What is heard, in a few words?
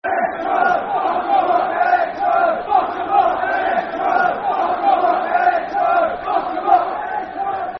Get Into Em, Football Chant (Soccer Song); Brighton Bhafc